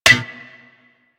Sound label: Melee Staff Impact Generic; Warframe; Weapons; Staffs